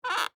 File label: Wood Creak Squeak, Old, Handle; Foley; Squeaks